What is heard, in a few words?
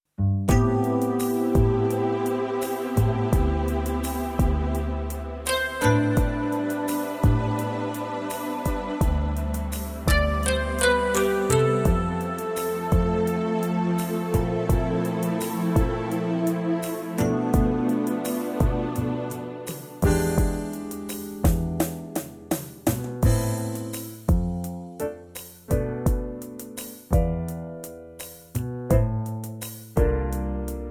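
Am, backing track; key - Am - vocal range - E to A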